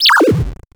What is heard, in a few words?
Animation Electronic